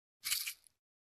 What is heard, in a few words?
Electric Oven Switch rolling